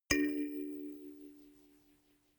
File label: notification kalimba rattle triad major soft